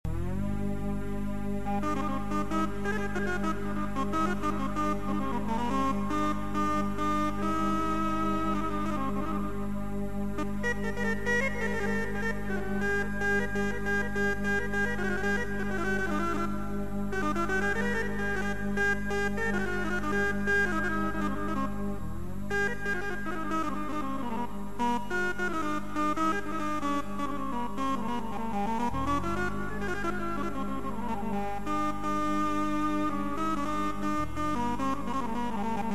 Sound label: Arab Synth